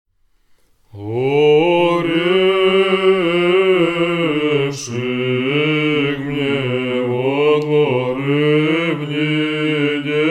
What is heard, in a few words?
third antiphon tone 3